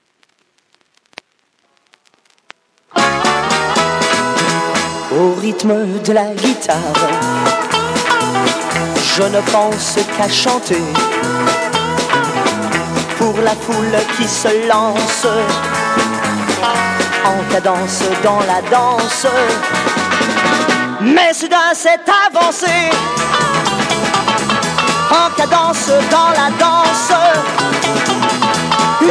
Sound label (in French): au rhythme de la guitare